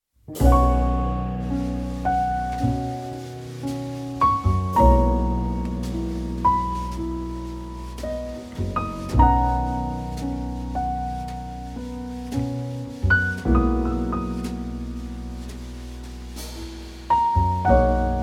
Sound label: quartet